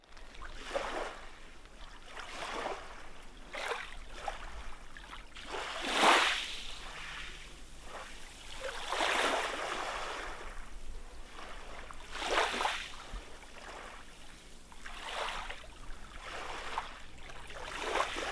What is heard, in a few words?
gentle waves on shingle; whitstable